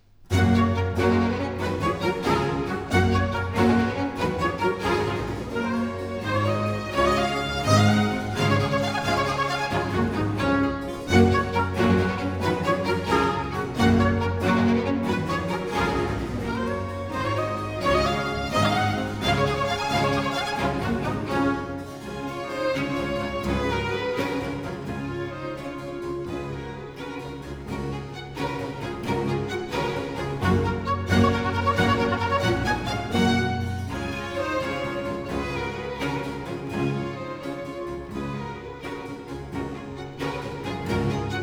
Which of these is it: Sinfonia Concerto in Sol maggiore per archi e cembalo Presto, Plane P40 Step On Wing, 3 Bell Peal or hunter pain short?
Sinfonia Concerto in Sol maggiore per archi e cembalo Presto